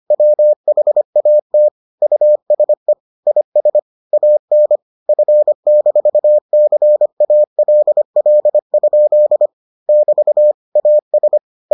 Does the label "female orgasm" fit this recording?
No